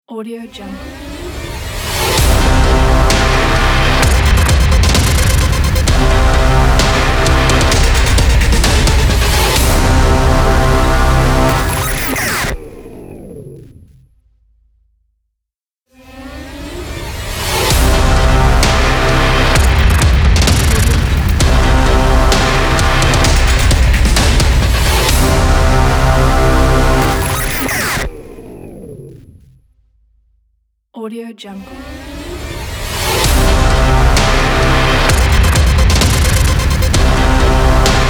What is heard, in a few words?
epic powerful aggressive industrial logo